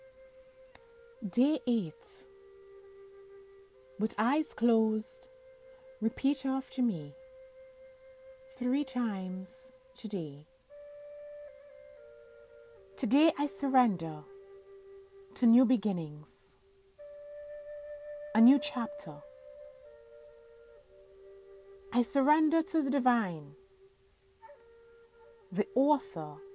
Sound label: healing mantra